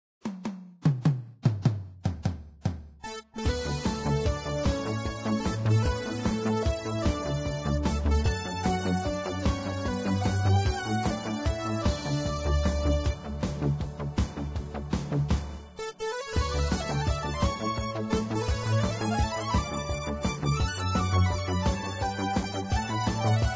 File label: Keytar; Zomboid; Lifestyle